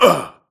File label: grunt hits; Titanfall 2